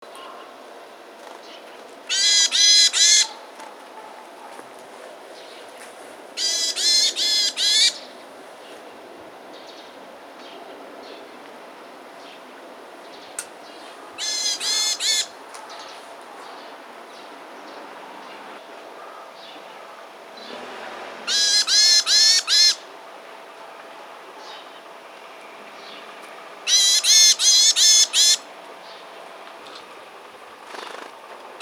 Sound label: Parus varius